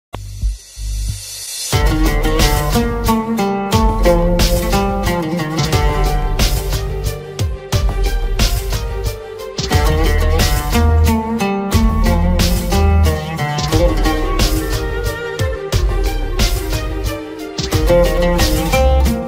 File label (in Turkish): telefon zil sesi; poyraz karayel